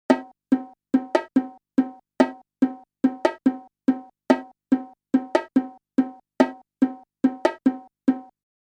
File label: 4 repetitions of the previously unpracticed rhythm 2:5